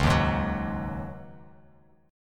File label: C sharp Suspended 2nd Suspended 4th, C sharp, Bass Standard; strummed; JGuitar